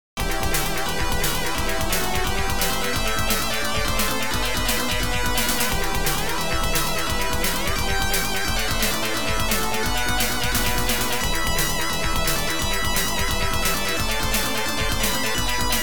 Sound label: OPL2; Tyrian